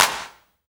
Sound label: CLAP HOU, Dance Mega Drums; Best Service